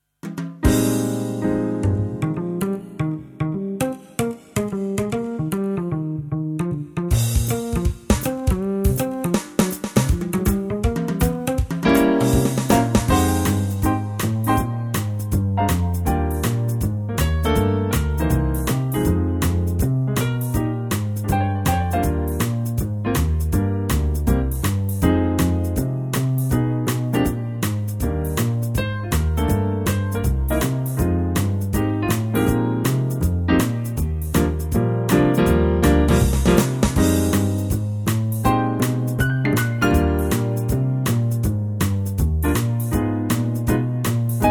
swing Eb

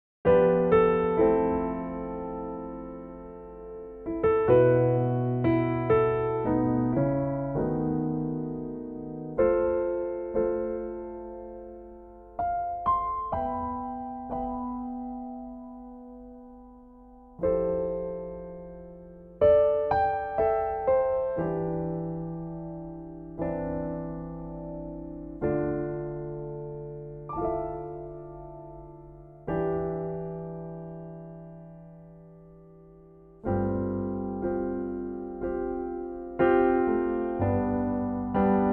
C piano; key - C - vocal range - C to F#; Pianotrak

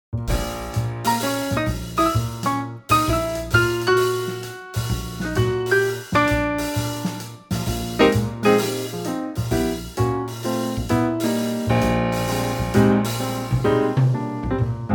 Eb Trio; key - Eb - vocal range - Eb to Eb; Trio arrangement of the jazz classic

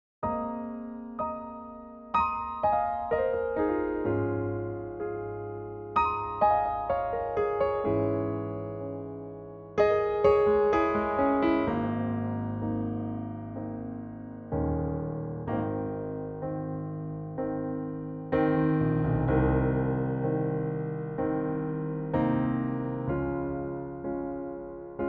Ab piano

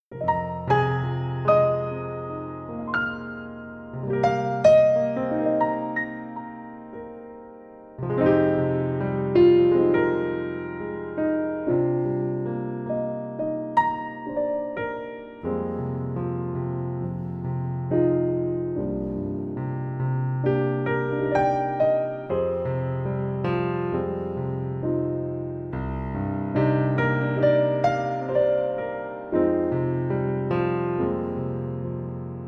piano; Pianotrak; key - Cm/Eb; piano only version

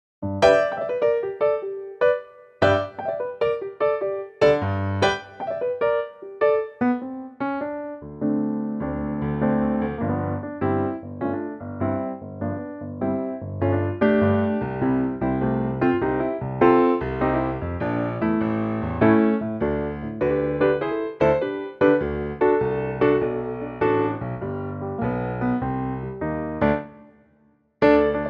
medley G Bb piano

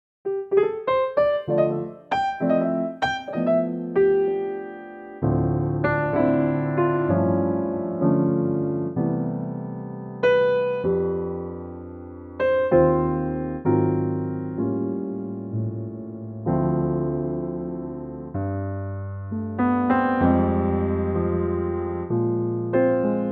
C piano

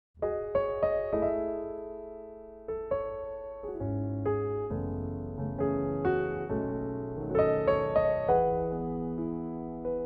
E piano; key - E to Gb - range - E to Db; Piano only arrangement

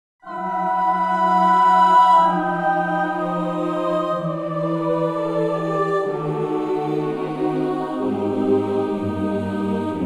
choir C; Vocalville

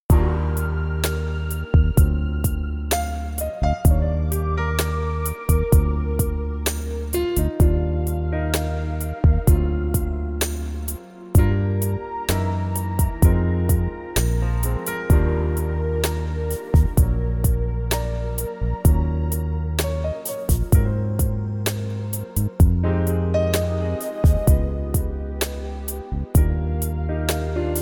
smooth

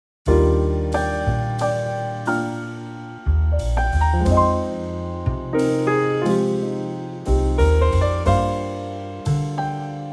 Eb jazzville

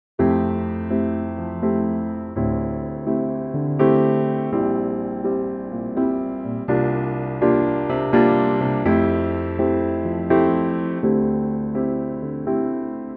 F piano; key - F - vocal range - F to G (optional Bb); Gorgeous piano only arrangement

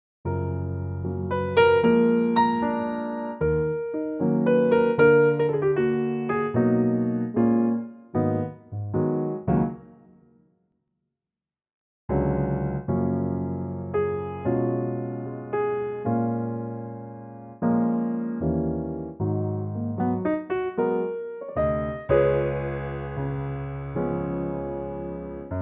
Gb piano

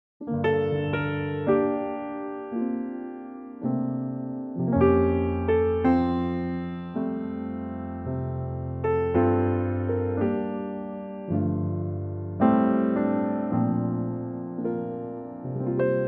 F piano trak; key - F - vocal range - C to F

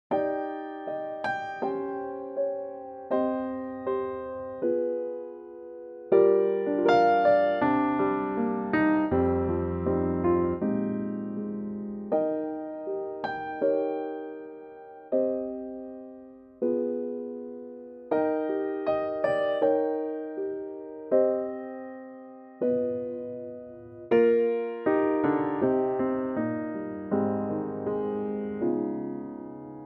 Eb Piano; key - Eb - vocal range - G to C

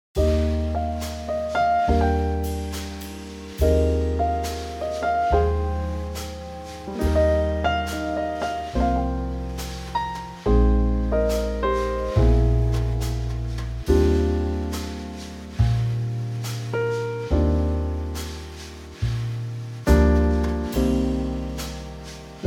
Eb trio

